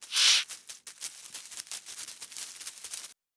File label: tarantula